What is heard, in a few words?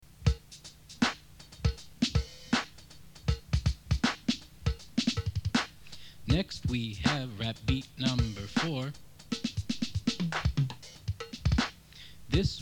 beat